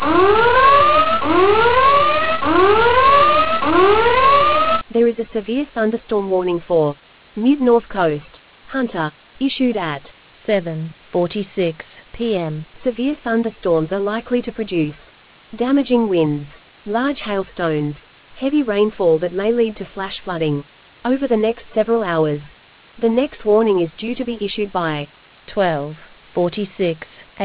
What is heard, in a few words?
storm audio